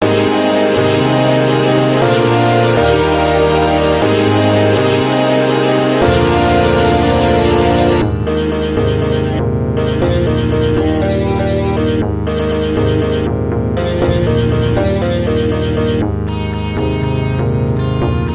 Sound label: Electronic Instrumental